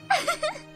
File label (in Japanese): うふふっ; マイクラ